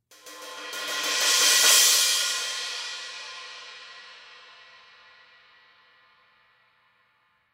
S Trash Crash Build Crash, cymbal

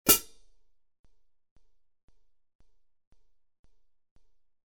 14in Mastersound HiHats, cymbal sound

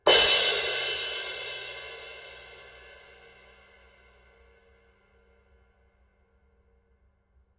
18in A Thin Crash, cymbal sound

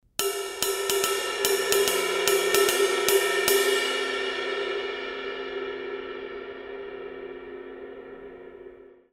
K Con Med Ride Ride Pattern, cymbal sound

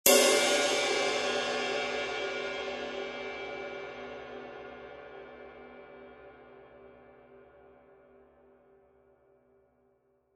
22in Custom Ride, cymbal

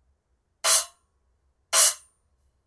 10in Trashformer, cymbal; ZXT

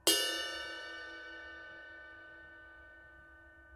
23in A Sweet Ride, cymbal sound

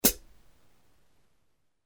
13 Hybrid Hats, cymbal

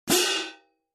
K Con Med Light, cymbal

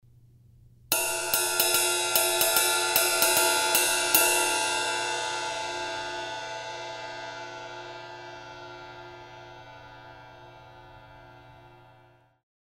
S Medium Ride Ride Pattern, cymbal sound

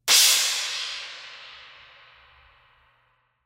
17 Custom Trash Crash Crash, cymbal

